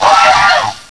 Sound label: pit worm angry